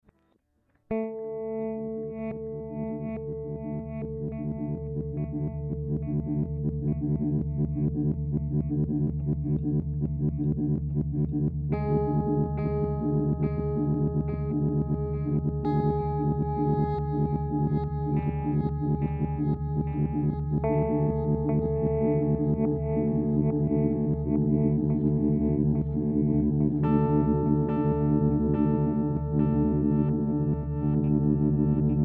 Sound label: Particle LFO Madness